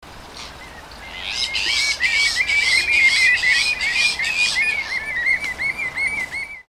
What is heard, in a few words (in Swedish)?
Tarnmas